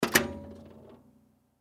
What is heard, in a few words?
Household Cabinet Door Glass Entertainment Center Open Wobble; Cabinet Doors; Blastwave